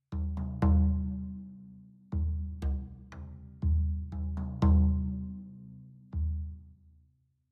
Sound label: Citadel Music Drums; Frozen Flame; windows